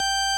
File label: ORG VOX G, Continental